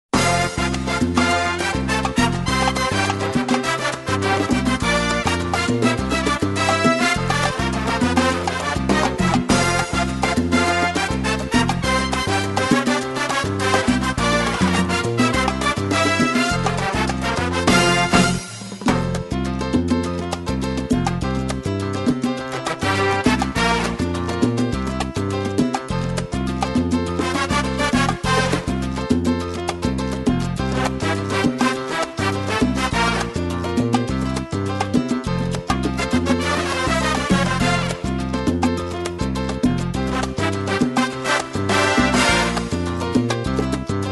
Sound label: SALSA 110